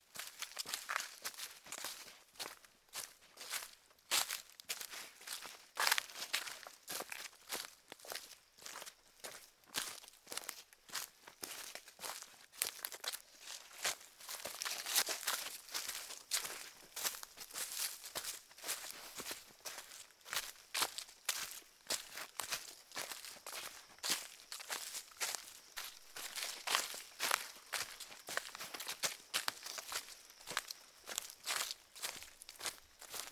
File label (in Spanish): PASOS UNA PERSONA SOBRE HOJAS SECAS CAMPO; caminar caminata campo